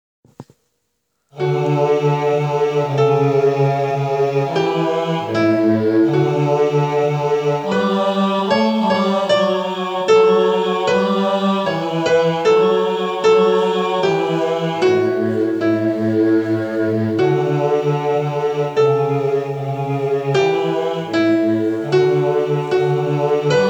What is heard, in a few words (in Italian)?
voce bassi